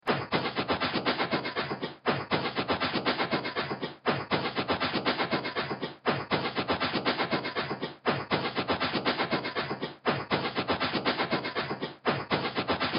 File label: music; DelphiArcadeGames; AlienInvasionStarter